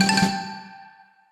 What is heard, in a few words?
Fanfare; nintendo game seminar 2014 doki doki letter relay; wii u